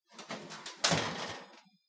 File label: Oven Door Closes; appliances